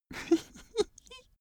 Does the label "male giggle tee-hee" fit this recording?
Yes